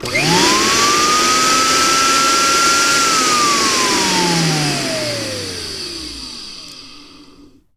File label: BLENDER RU, Kitchen Counter; SFX; E-MU Producer Series Vol. 2; More Studio Essentials